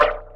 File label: Perc